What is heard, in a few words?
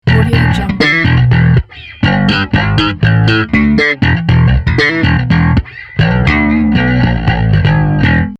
chorus bass logo